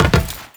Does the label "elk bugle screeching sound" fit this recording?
No